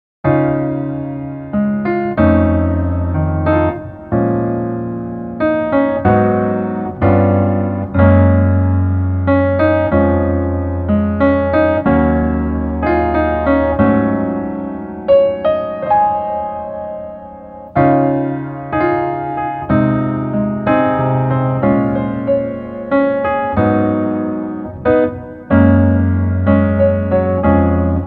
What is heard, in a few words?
Piano